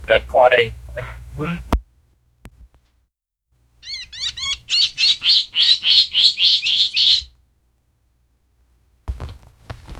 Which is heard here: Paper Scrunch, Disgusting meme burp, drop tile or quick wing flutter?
quick wing flutter